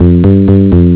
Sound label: tune